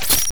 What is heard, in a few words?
unlock; ODST